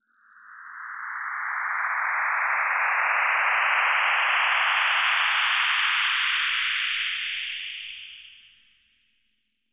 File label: uranus xray, sonify